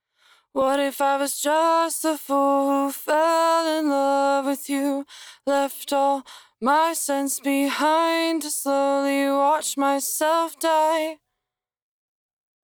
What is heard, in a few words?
Main Vocal DRY, Acapella, 150 bpm C# Minor; Ghosthack; Emotions- Acapella Collection